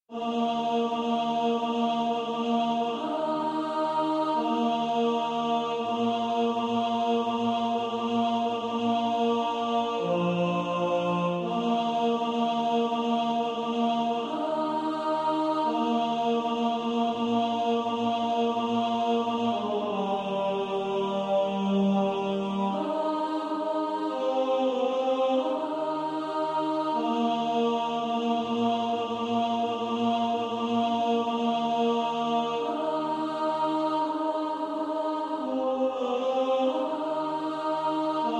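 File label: tenor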